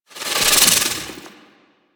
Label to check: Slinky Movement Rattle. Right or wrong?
Right